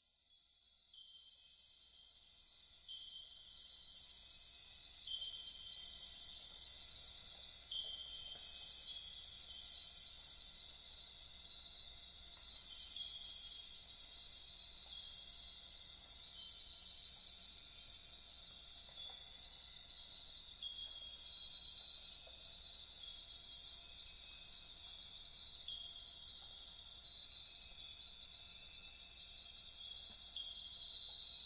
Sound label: atmospheric quiet jungle; Atmospherics